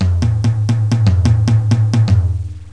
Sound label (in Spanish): TAMBOR; Pipo-Aprende musica con Pipo